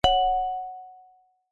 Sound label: multimedia alert notification ui ping chime mallet like; zapsplat